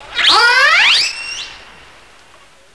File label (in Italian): WRD CALL, richiamo uccello; Tono di notifica; per Cellulari Android o Iphone